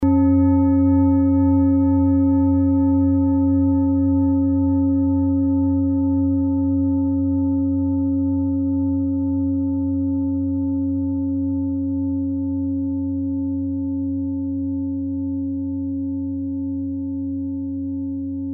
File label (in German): klangschale set; Klangschalen-Chakraset N°7 für alle Chakras; Gewicht = 2580g; Durchmesser = 30,7cm; Höhe = 12,5cm